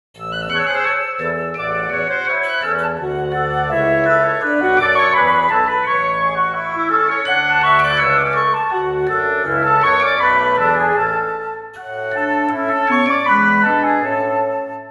cup wind; Oboe, English Horn, Bassoon, Contrabassoon

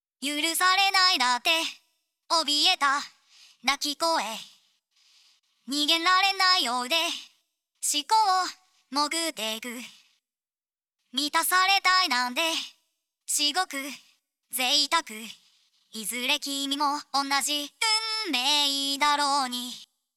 Vocal Plate Before; RMX16; neve

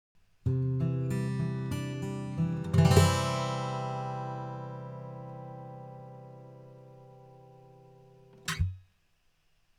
AG XY; LCT040

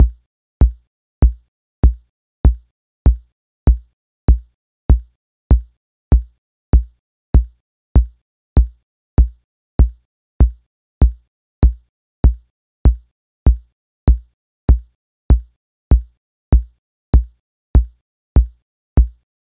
delay kicks cr78 hi fi crunch wet; aas; objeq delay

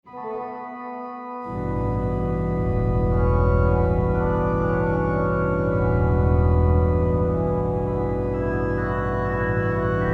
Cornet; Virgile Monin

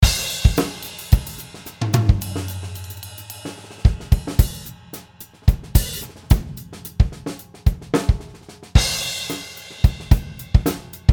Smooth Jazz; BFD3 Preset

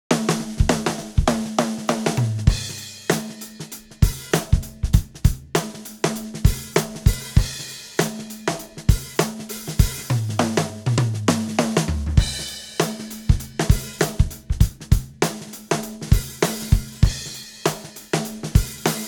Drum MIX 1073; Drum MIX 1073OPX

